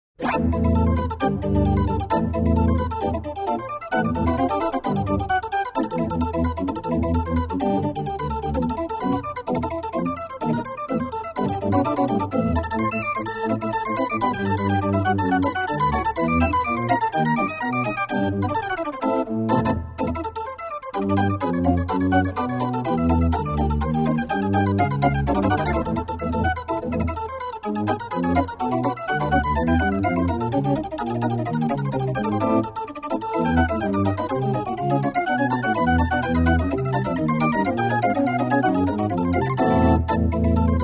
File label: Polka